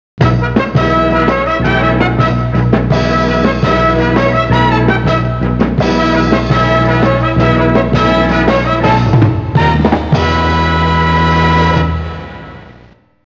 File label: Game Win Cue, Game Show Sounds; The Joker's Wild; 5th Game Win Cue